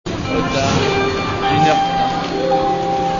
jingle SNCF

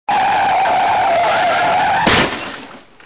auto accident

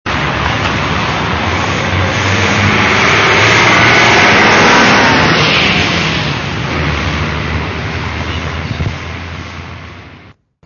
auto passage

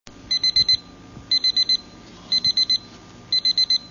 sonnerie reveil

